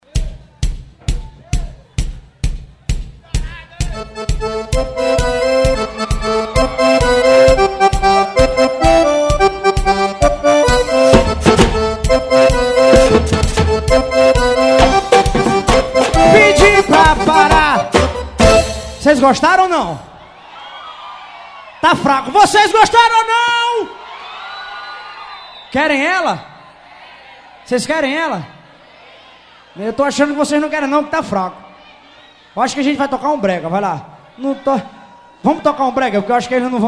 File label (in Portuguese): samba